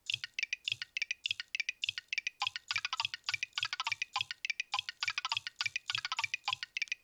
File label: nokia 6100 ringtones; chipmunk